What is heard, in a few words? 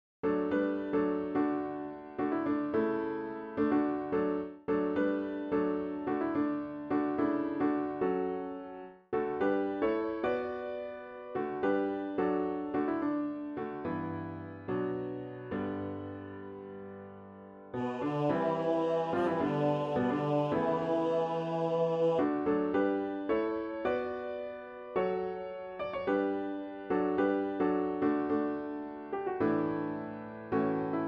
large choir low voice D; Fellowships – Mass Choir, low voice practice file